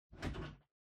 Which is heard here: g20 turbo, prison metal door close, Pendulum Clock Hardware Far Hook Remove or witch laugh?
Pendulum Clock Hardware Far Hook Remove